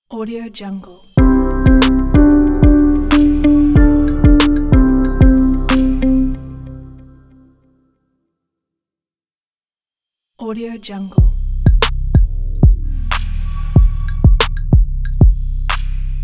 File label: corporate logo reveal; terrasseproduction